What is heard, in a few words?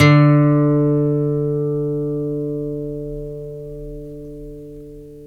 GTR 6STR D, Steel String; Roland LCDP02 Guitar and Bass